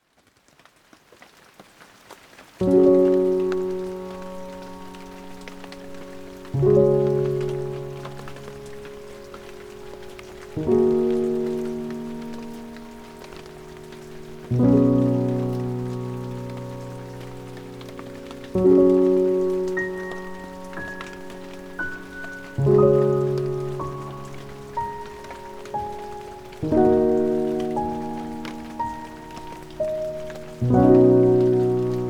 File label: The Secret Garden (With Rain), Calming Piano Music with Rain